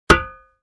Empty paint can single hit

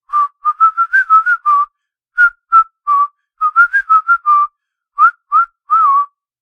Man whistling a little song

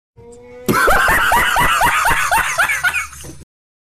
Heavy distorted laugh; meme

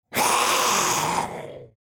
Demon beast imp monster pain damage hurt

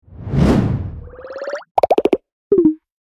Infographic graph build up pops whooshes

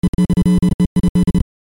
Buzzing bursts speakers malfunction; headphones and speakers

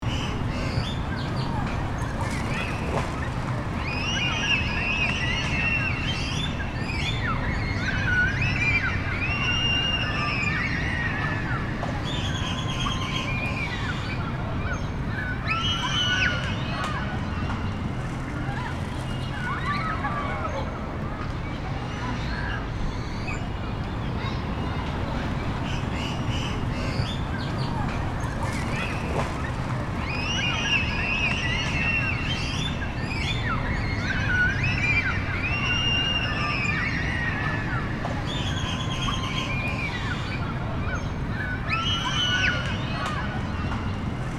Urban playground by road kids playing traffic; gfxsounds

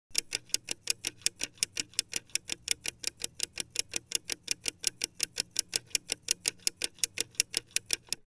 Passing seconds ticking time; shorts sound effects

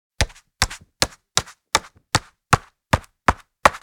Monopoly board game token moving

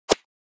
Paintballs flying by fast swish whiz; sport

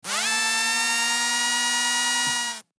RC truck electric motor whirring; rc toys

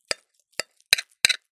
Dinner or lunch scraping the bottom of the plate

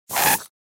Sauce bottle empty squeeze squirt air; cooking

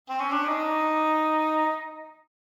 Cartoon gliss

